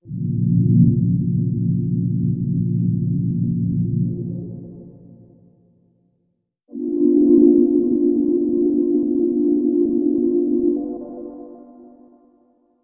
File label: Atmos C